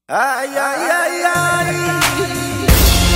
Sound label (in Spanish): ayy ayy